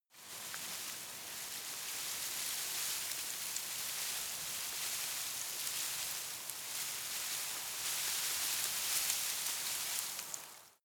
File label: shrub tiny leaves rustle soft; haus-garten